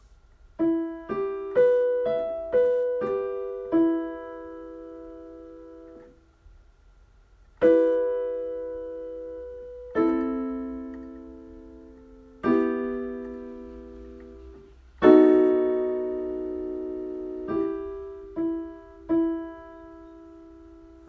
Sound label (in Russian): Аккорды